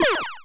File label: MOVE; C64 Dreams; Multi-Sound Default